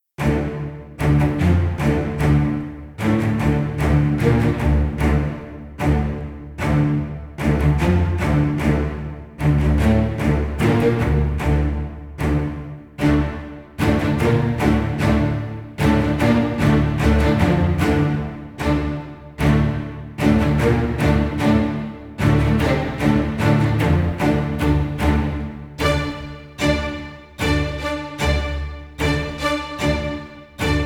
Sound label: Combat; The Witcher 3 Wild Hunt; Skellige